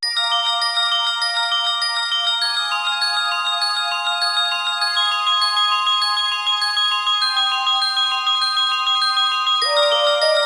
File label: Calm Alarm; Sony Ericsson; G702; PlusFourSix; Beibei; Lars Edvall